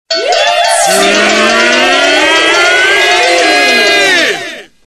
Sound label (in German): glockenhutte